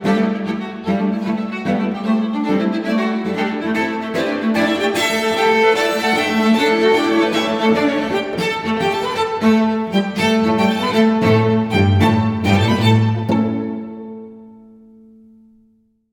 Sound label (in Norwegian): Udelt takt